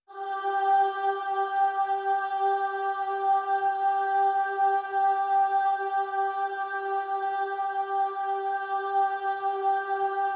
Pop Vocal G

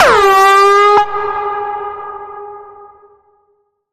Bend, F sharp

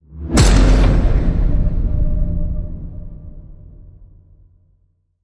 Hit Big Clash

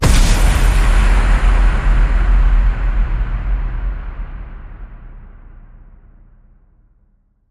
Hit Big Forged

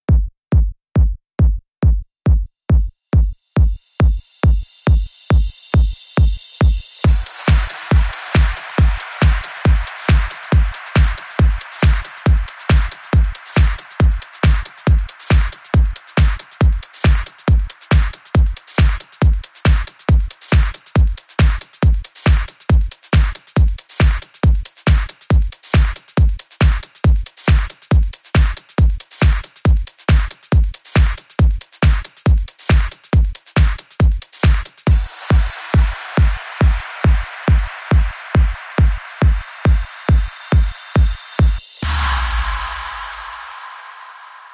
Beat

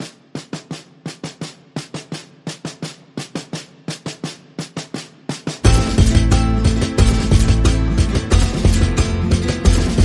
No Woodwinds

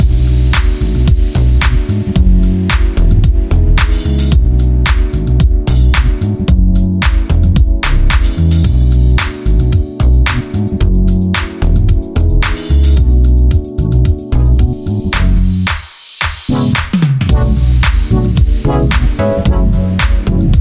Roller Disco

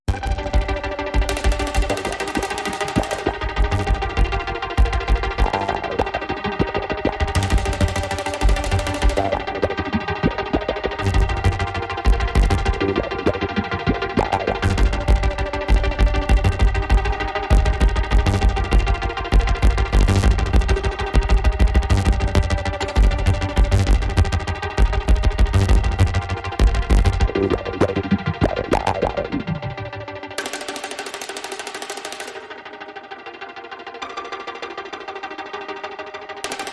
Faster Faster, Underscore

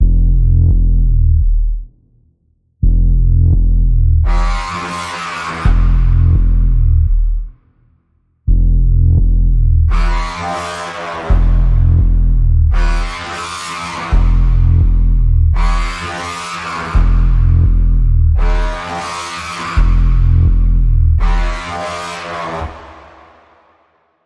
Insidious Pulse Bends F, Key of F

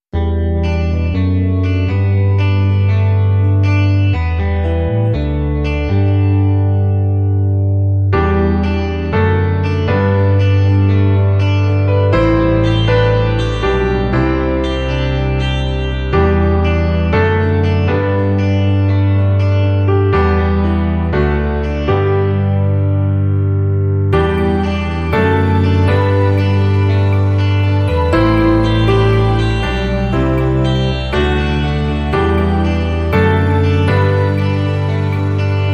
Forever, No Drums

